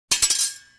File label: Double Sword Hits; Fights Bodyfalls